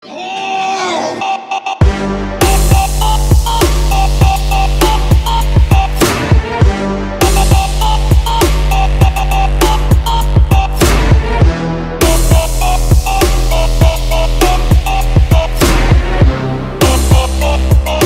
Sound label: trap remix; the walking dead